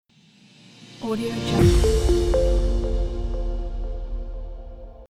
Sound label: logo inspiring corporate motivational technology